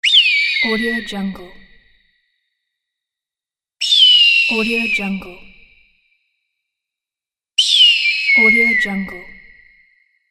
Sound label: eagle